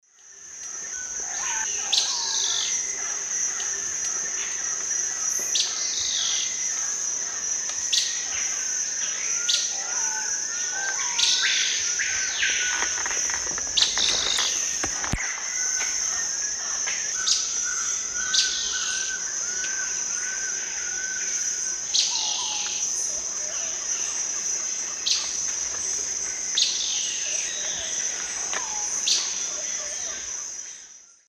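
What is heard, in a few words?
Hylophylax poecilinotus, calls playback; S. America; Eastern Lowlands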